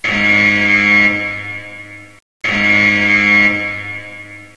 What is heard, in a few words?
lock alarm, ambience; caged